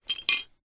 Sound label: metal sequence slide gear change mechanical gear mechanism accent; ancient game